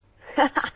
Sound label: laugh